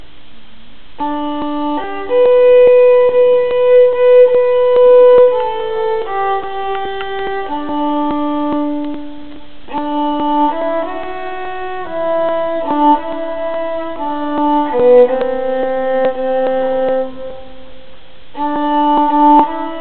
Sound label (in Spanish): himno violin; himno a Sandoval